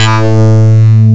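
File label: bass synth, ANALOG; CMI Sound Library Rev 1.3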